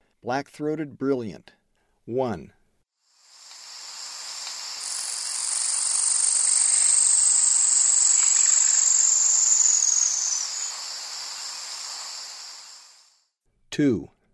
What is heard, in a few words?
Black-throated Brilliant; S. America; Eastern Lowlands